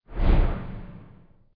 Short Whoosh Hit; Learn to Fly 2

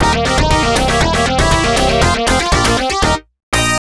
Round Clear; Fantasy Zone; X68000

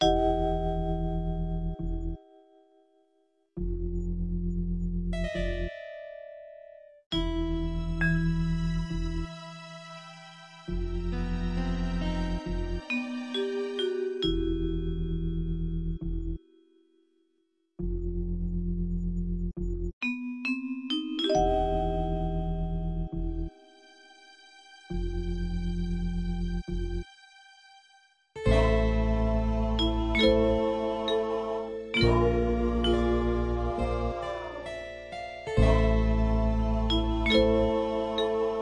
Theme Ambient Vibes; The Operative No One Lives Forever

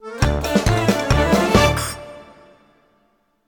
Demote Fanfare; Angry Birds

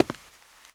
walk concrete

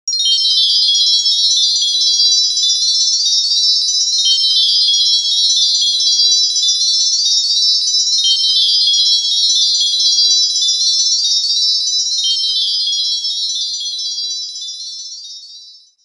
Pyramid Jingle; Banjo-Kazooie's Great Adventure

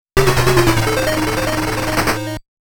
Miss; Legend of Joe Musashi Shinobi Music Collection

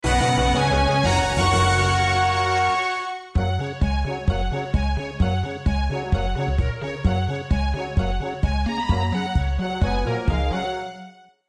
se win; Adventure Dig Dig 2; Android

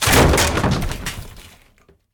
Door Kick; Still Life; windows

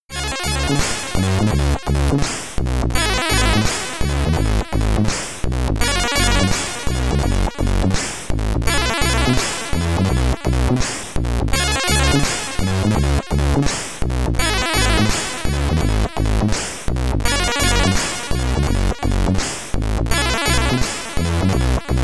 Name Entry, SID Stereo; l.e.d. storm commodore 64

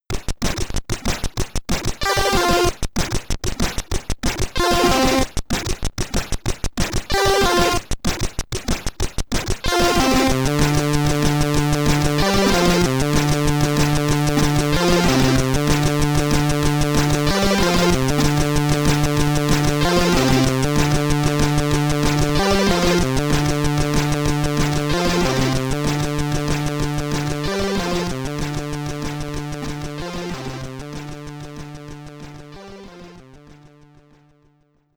Shop Theme, SID Stereo; Black Tiger; commodore 64

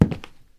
Inside Foot Step

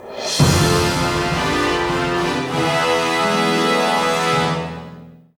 Place Goal Fanfare; crash bandicoot series sound box; Rings of Uranus

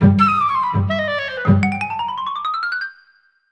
Stinger

